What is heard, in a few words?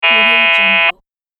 wrong buzzer sound